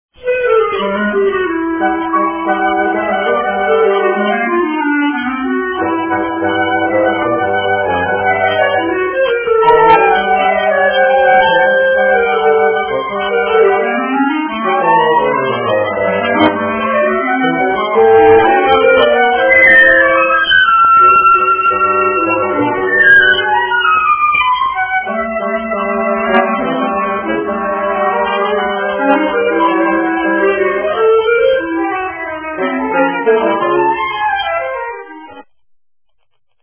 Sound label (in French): trio enjoue